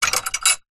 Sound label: household gate wooden metal latch unlock open